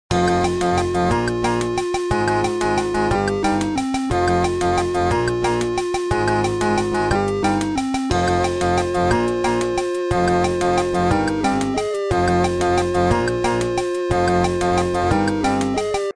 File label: Casio Theme; mirrors edge ios gamerip 2010